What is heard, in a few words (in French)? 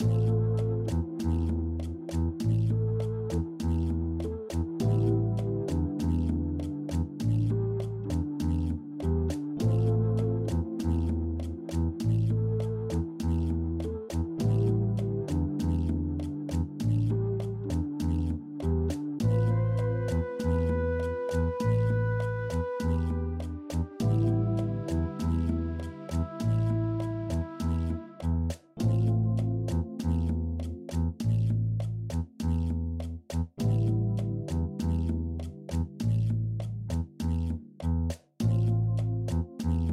musique d attente loop